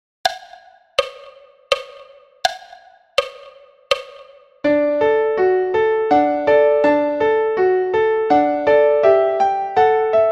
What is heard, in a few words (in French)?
Menuet de Mozart piano a 82 bpm